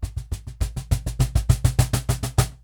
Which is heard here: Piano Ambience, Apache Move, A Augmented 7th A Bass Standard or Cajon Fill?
Cajon Fill